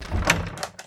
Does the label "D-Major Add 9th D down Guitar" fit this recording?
No